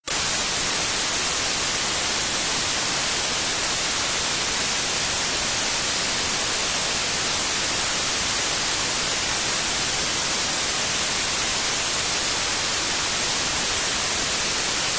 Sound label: white noise